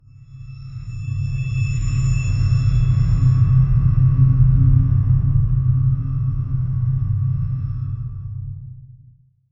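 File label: a deep metallic vault opening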